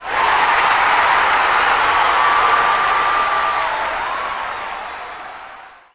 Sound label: BMX Crowd Cheer Medium; Crowds